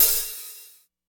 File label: piercing open hi hat one shot f sharp key